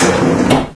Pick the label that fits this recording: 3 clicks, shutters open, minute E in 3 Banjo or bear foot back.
shutters open